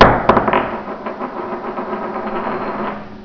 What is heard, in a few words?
rolling